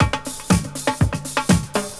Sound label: 120 HOUSE, drums